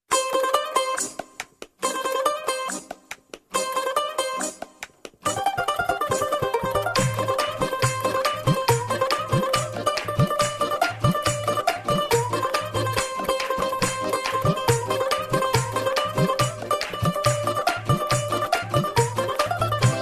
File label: english instrumental ringtone